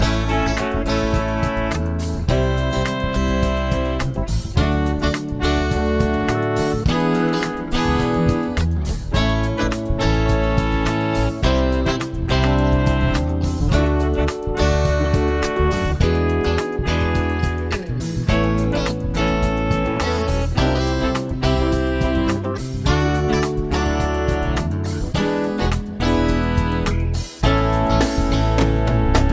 chord prog with mixture

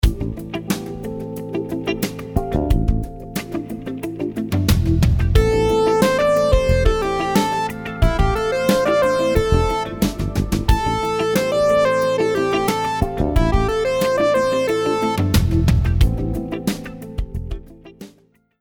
rhythms 16s syncopated

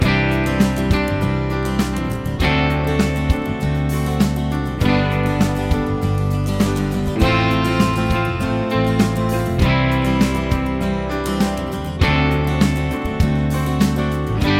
progs with applied chords

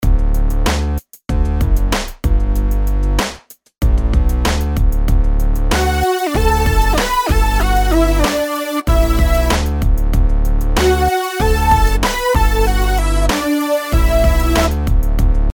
melodies simple Dmaj; melody

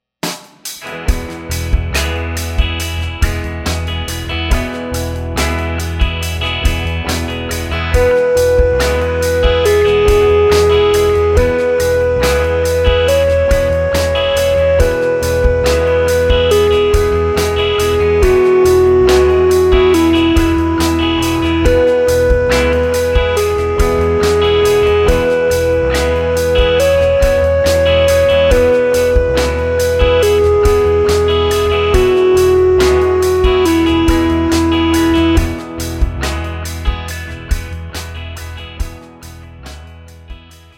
scale degree

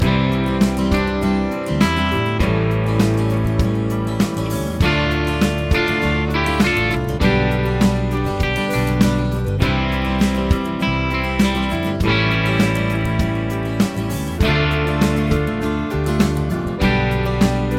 progs with applied chords

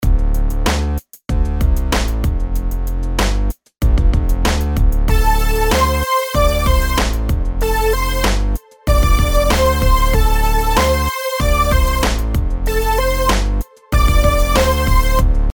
rhythms eighths; simple 8s

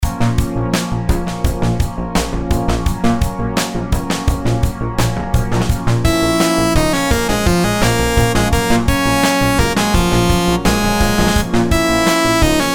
rhythms simple dotted 8s 85

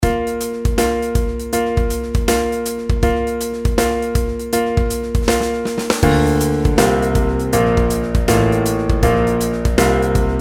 pitch patterns stepwise

